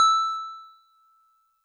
DigiPianet DX100 E6

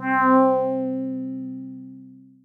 SYNTH Cool FM single Note

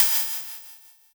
Hihat O

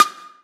PERC Huge Poppa

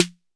SD 808 Punch

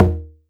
Perc; EFM

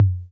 Tom Low C 808 Tape, Clean

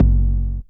BD 808 Phase

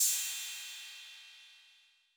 Cymbal 808 Decay, Clean Digital

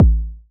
KICK A; Jewelz

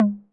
Tom Hi 808 Saturated B

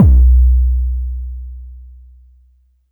BD

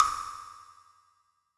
Woodblock Hard Mod

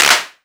Clap; EFM

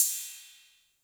Cym A 808 Tape Decay A; Cymbal; Clean

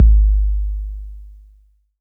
BD 808 Tape Decay

